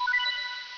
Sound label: item pickup; metal gear solid